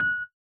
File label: ping